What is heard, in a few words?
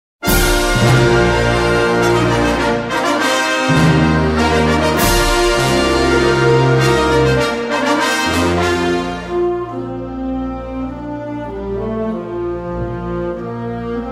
Fanfare; Montana